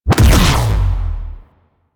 Mech Sword Heavy Charge Projectile; Nechro

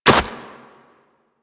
Run Snow, Footsteps; Warframe; Operator

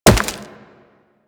Wall Jump Rock; Footsteps; Warframe; Tenno; Wall Run

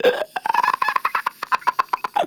Infested Runner Patrol; Warframe; Runners

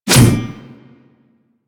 Mace Whoosh; Warframe

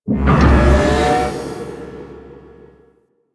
Gun Charge; ambience; Warframe; Grineer Galleon

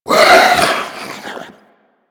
Head Shake; Warframe; Stalker

